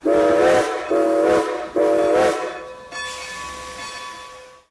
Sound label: steam train whistle